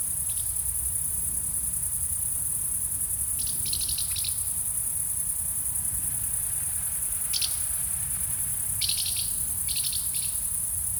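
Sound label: common house martin urbicum, flight call; jbog west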